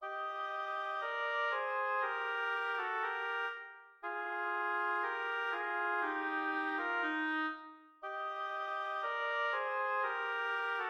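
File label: easy duo oboe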